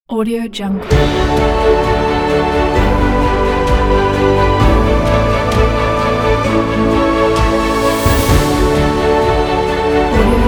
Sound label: grand opening